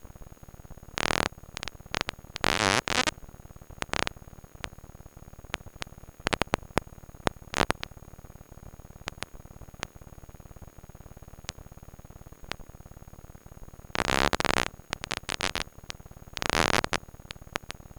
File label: porpoise